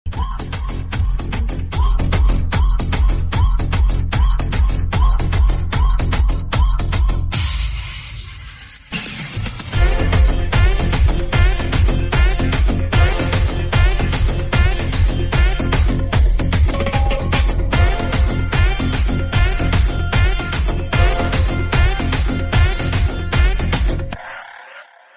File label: mass bgm, song bgm; pongal song; Sankranthiki Vasthunam; venkatesh movie